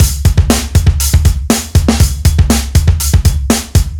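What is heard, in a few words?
Deep rooted A, drums rock alternative; ударные и барабаны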